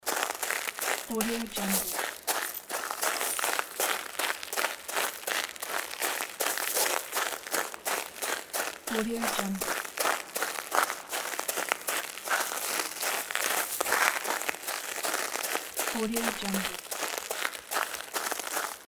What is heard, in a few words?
footsteps running on glass debris; fascinatedsound